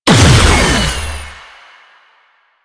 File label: Research Pod Shield Destroyed; JadeShadows; Ascension